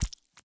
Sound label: slime splash; CrossCode; battle